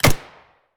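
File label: Weapons Handgun Shot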